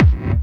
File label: hard bass drumz